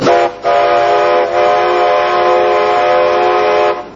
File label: horn